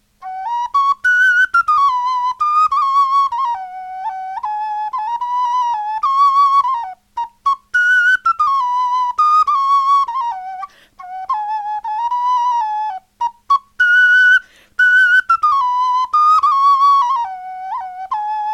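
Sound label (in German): melodie, Knochenflöten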